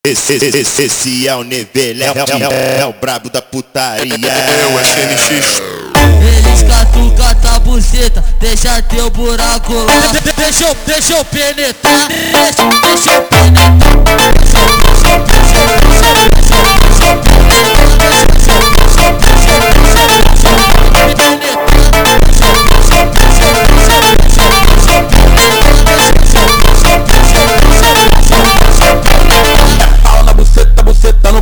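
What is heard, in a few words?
slowed, phonk music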